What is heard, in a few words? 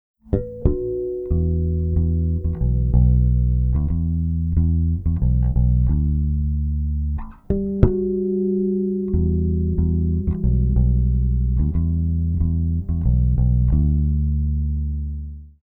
Processed Electric Bass